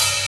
OPHAT; Funky Ass Loops; East West

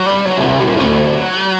Krush, Electric Guitar; Sonic Foundry (Sony Creative Software) - Friendly Fire

